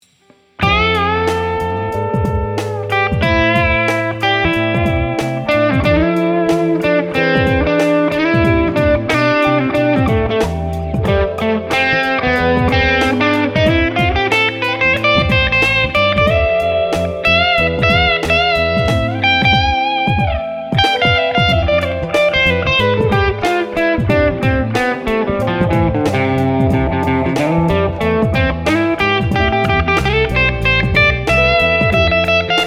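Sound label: A minor major solo, guitar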